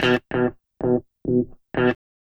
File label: Guitar, RnB